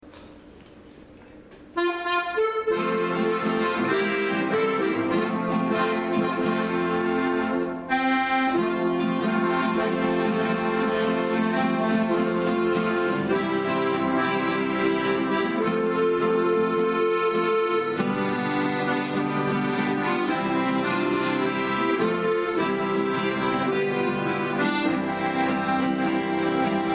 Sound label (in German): Hüttenlied instrumental